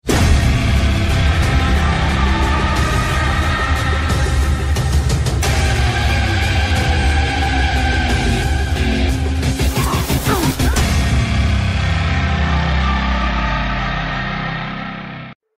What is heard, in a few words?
hollow body ringtone